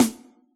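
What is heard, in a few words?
acid jazz kit snare; Dr 202 Kit 1; Percussion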